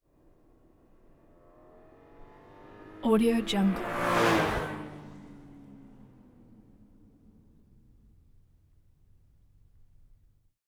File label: 2002 bmw m3 car uphill accel pass by; prosoundfx